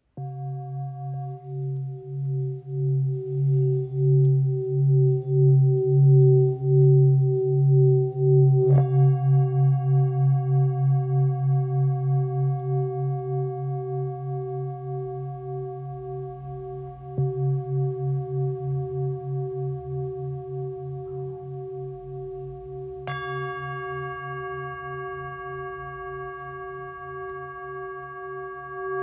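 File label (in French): Bol ramgandi do diese, bol chantant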